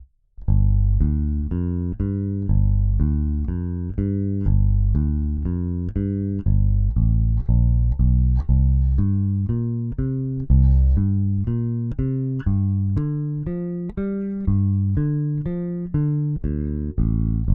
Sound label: AER AMP One Two Three Tone Balance dark